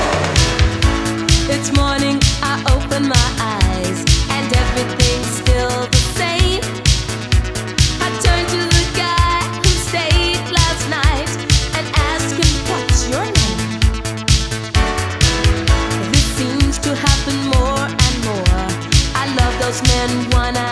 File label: disco